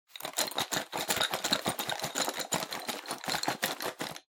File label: Pencil Case (Shake)